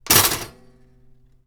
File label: Toaster Pop